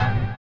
STREET; Spectrasonics; Metamorphosis

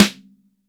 MIX SN, DRY STANDARD SNARE

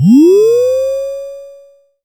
100 NOISQ; AMG - Vince Clarke Lucky Bastard

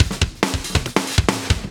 Fills, Loops 140 BPM; Vengeance - Essential Clubsounds Vol.4

